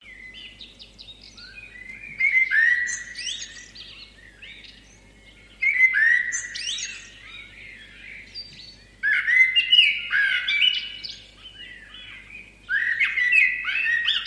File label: zvukovoj effekt ptici birds sound fx